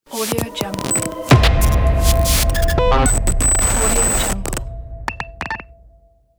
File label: glitch logo